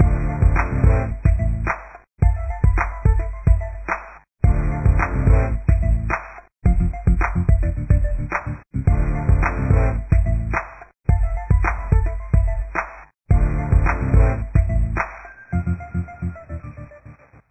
Loading; NBA Pro Basketball 2010; windows mobile

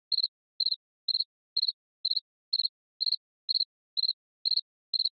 Env Bug Cicada Loop; Sx Env Bug Cicada Loop 04

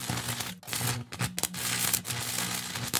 spinner Sparks Low; unsighted

